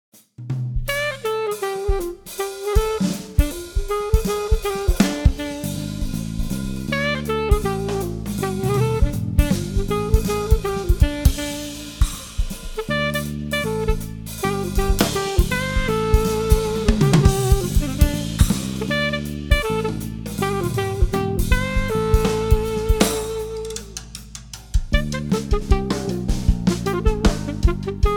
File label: instrumental